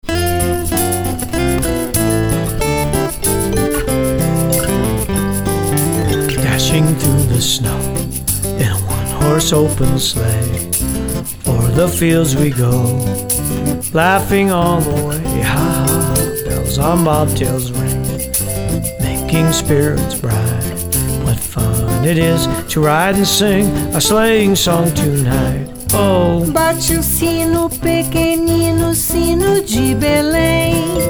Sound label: Jingle Bells